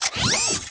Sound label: Menu Scifi